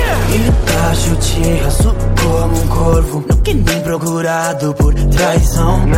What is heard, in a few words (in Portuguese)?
rap